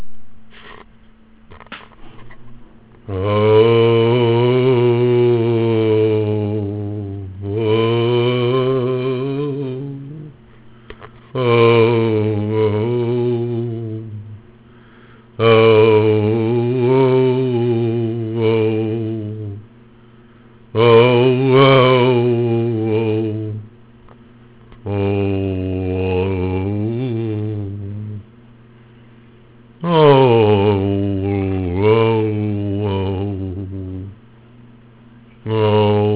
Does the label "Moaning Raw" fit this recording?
Yes